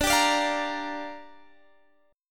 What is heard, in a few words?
G Minor 7th, D